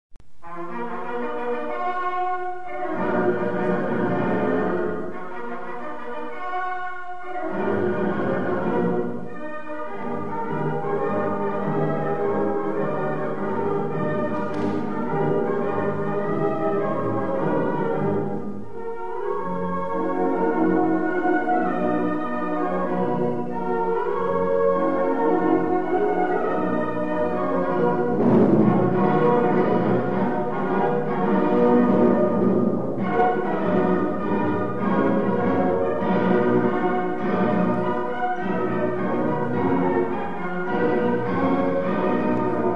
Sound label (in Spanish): triple himno; rne